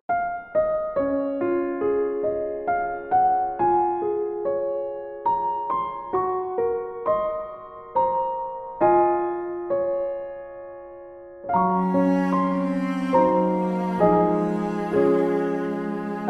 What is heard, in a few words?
heavenly christmas music instrumental christmas songs cello piano; colinde; CANTARI